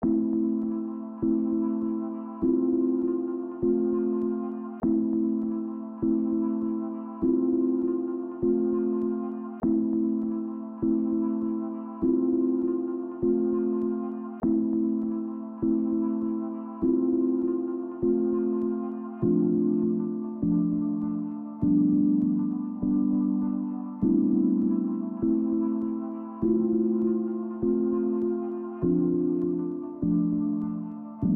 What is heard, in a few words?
Pad